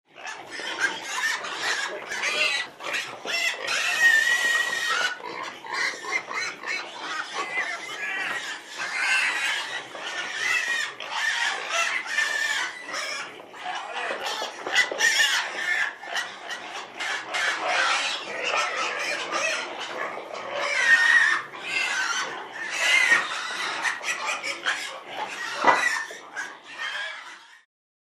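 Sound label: piglet voice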